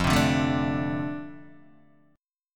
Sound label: F 6th F down Guitar Open D